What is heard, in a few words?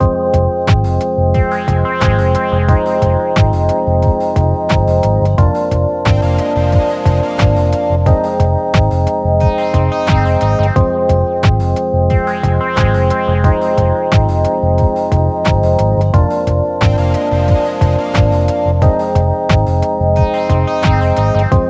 Banjo End Neg B4